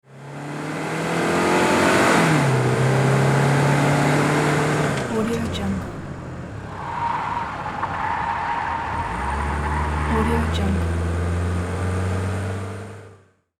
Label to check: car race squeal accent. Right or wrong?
Right